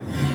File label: Scrap Container Dome In; Warframe; Missions; Zariman; Zariman Assassinate